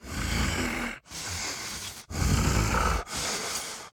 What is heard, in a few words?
Walk Cycle Burns; The Town